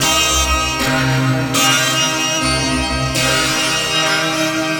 Temple Gate Strings; Sonic Foundry (Sony Creative Software); Myths of Technology

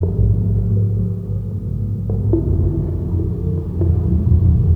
ambience

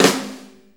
6-8 Snare Flam, Drum Loops; Sonic Foundry (Sony Creative Software); Loops & Samples Drum Tools; Flams

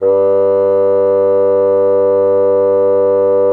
FAGM G2, BASSOON; Xsample VOL-6

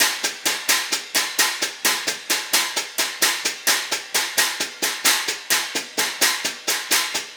Cymbal Pattern

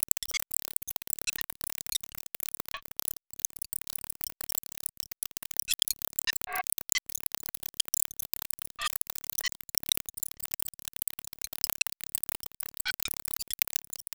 this is rly high pitched; random and out of place

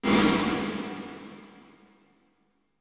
REV, ROOMS

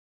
Rooster; SNES; Winter Olympic Games

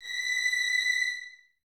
Violins Detache, B 6; 23 Violins; String Ensembles; Miroslav Vitous

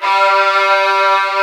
VIOLAS D2 L, SOLO VIOLIN; AKAI S-Series CD-ROM Sound Library VOL-7

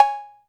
Perc, Alesis DM5

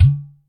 Udo, Percussion, Yamaha DTXpress

